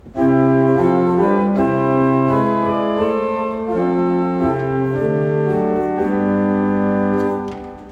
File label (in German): Prinzipal 8, Orgel; Dettenschwang; Renovierung